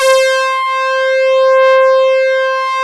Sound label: PAD C4; Best Service Gigapack 1